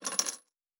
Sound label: Chain; Fantasy Sounds Bundle; Craft